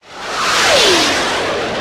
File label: missile fire